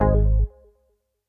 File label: chip fail